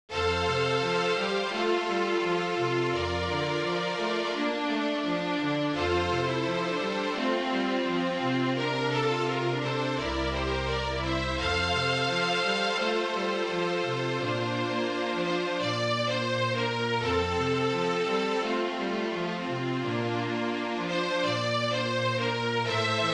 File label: MIDI Quartet